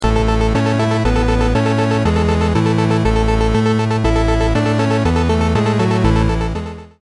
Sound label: stuttering melody